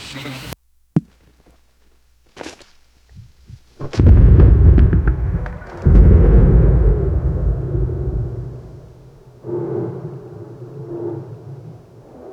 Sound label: tyrannosaurus rex hunting